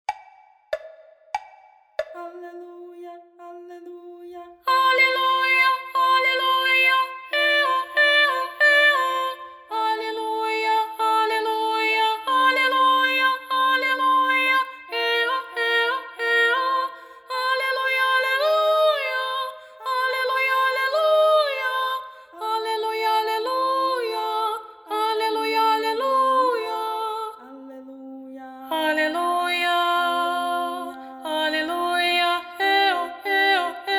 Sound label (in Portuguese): Aleluia VOZ; Voz Guia